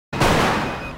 ירייה

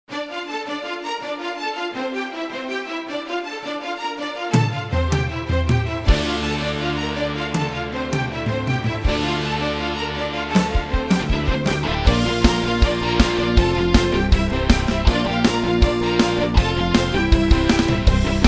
גיטרות בקורג

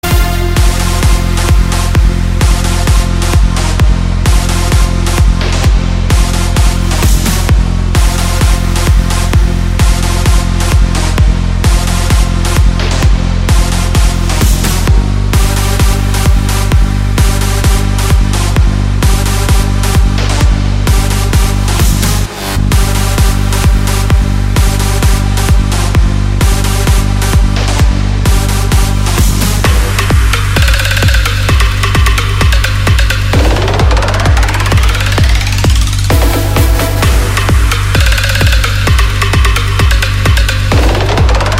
רינגטון

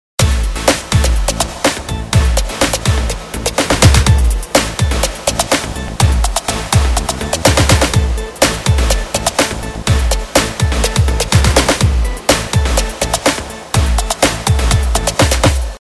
לופ גזעי

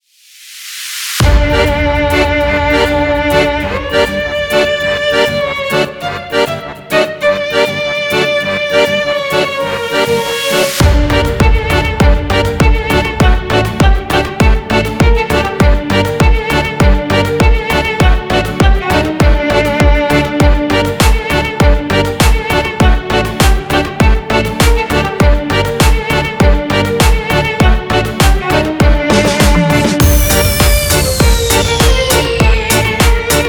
ניגון שמחה

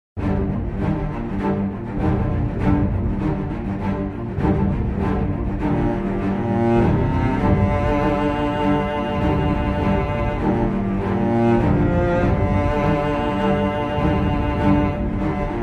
דרמה

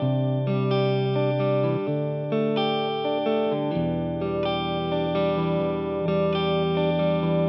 לופ גיטרה

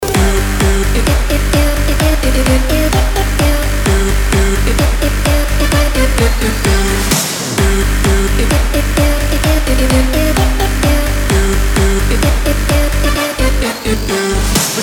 רינגטון

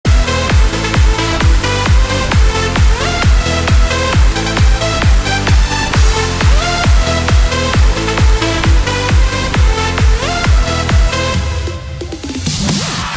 רינגטון

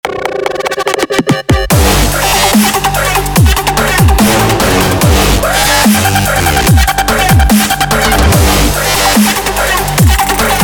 דאבסטאפ

